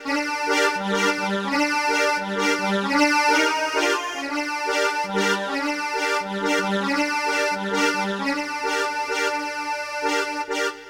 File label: French Accordion For French Ambience